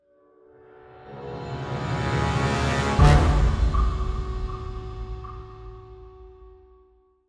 Cresendo Hit; rambo the video game 2014

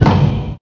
bang; super trench attack 2

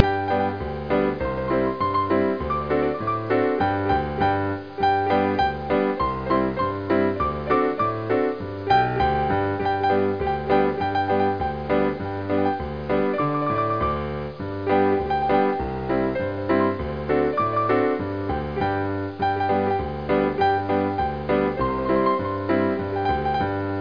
Saloon Ragtime

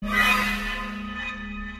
SHOCK; F.E.A.R. Perseus Mandate

